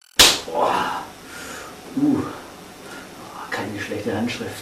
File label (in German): ohrfeige links